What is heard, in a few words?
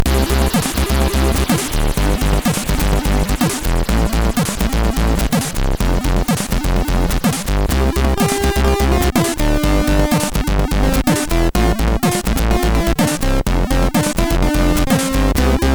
Microwave, MOS6581R3; hvsc